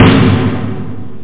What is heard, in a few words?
snare drum roll; OctaMED SoundStudio V1; drumkit